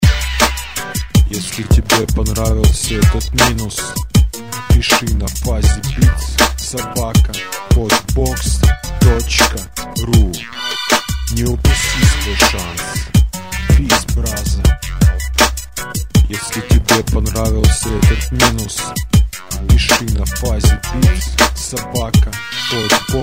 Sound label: funky